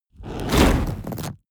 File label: whoosh fire lava wave small